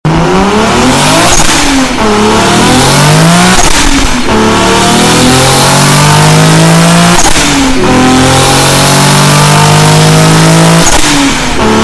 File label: Turbo; Skyline